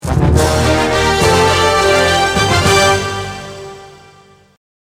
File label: Thrill Zone Start, pinball music; 3D Ultra Pinball Thrillride